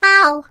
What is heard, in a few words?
turret shot by laser